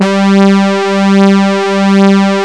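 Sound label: G3 brash synth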